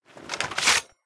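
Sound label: dagger one hand draw, equip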